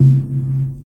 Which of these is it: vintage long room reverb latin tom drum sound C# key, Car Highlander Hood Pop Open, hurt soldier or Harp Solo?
vintage long room reverb latin tom drum sound C# key